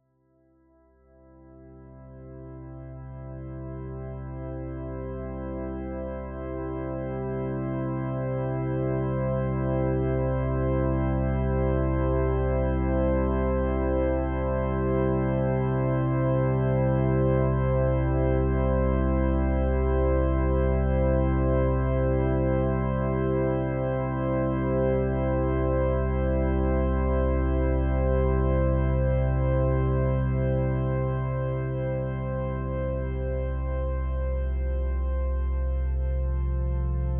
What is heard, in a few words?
downshift